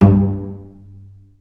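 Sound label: solo pizzicato, Strings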